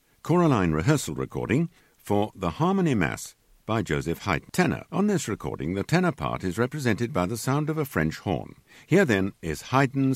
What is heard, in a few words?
Tenor; Haydn Harmoniemesse